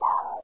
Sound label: windows 98 asterisk; windows 9x; sound schemes